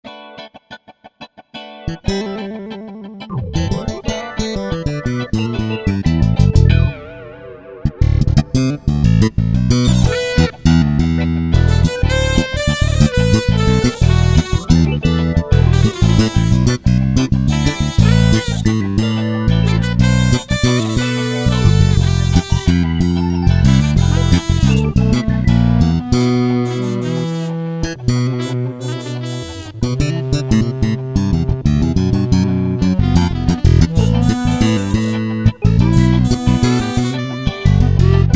korg z1

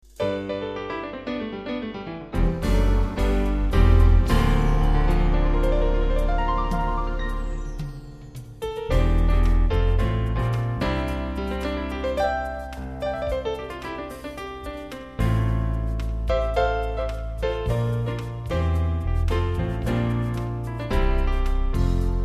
technics kn5000

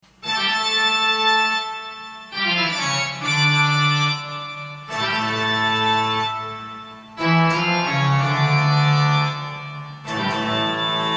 kurzweil 250 organ church

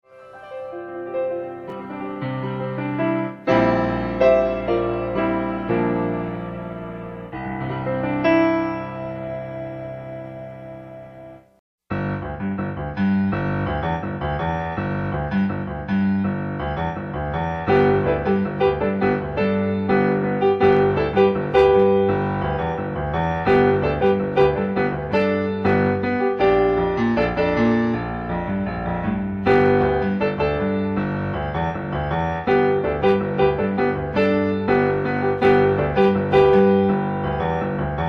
kurzweil 250 piano

